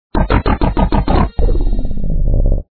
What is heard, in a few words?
Music Lose; SpongeBob SquarePants Delivery Dilemma